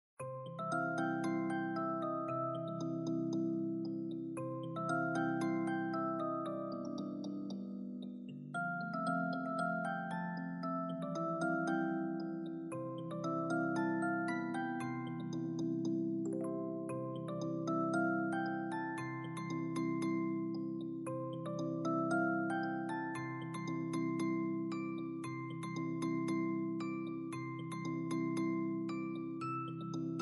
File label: music box; Wacky music box